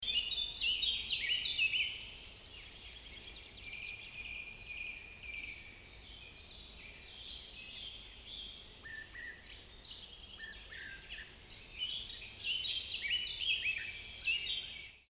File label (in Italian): capinera